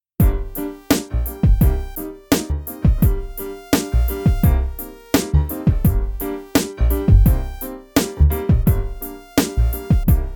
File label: Beat